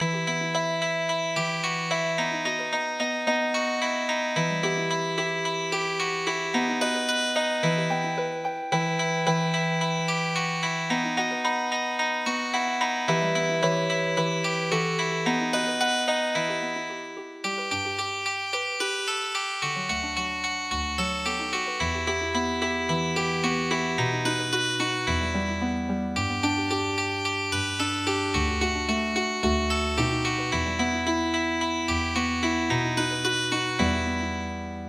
Healing Harp, traditional

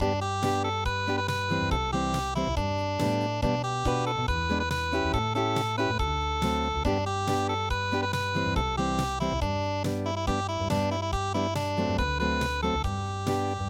unpluged; Campers will shine tonight

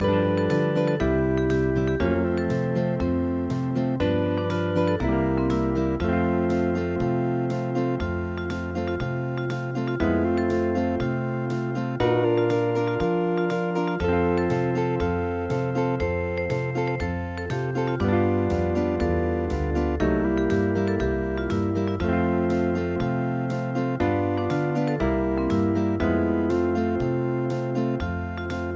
hawaiian

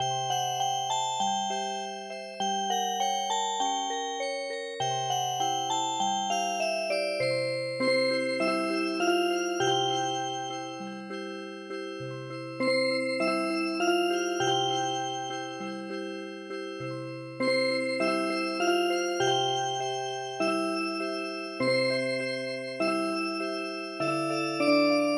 When The Saints Go Marchin In Music Box

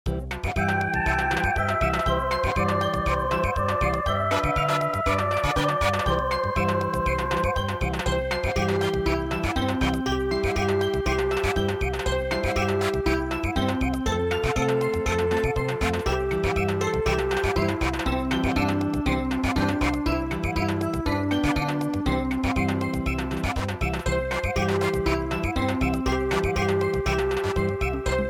Samba

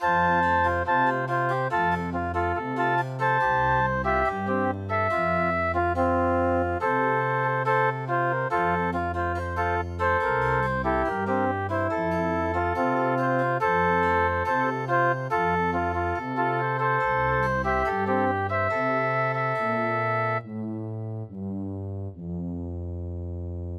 flute; At a Quiet Lakeside

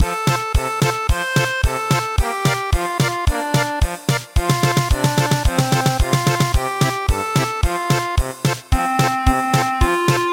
German Polka; Tanabata sama

